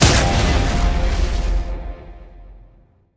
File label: fire; smite; baronsamedi skin7